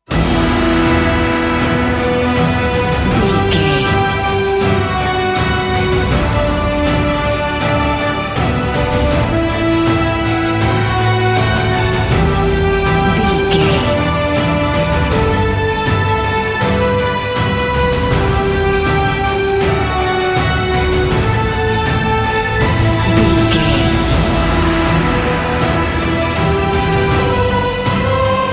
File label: epic cinematic